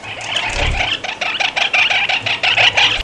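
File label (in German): zanken, Katharinasittiche, Lautäußerungen; Die Lautstärke und Intensität dieses Wortwechsels nimmt dabei immer mehr zu, bis einer der beiden Streithähne nachgibt oder verscheucht wird.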